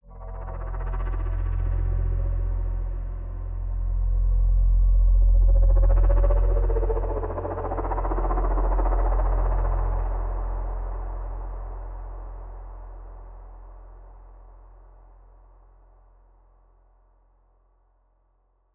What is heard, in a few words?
wet, reverb; datorro reverb implementation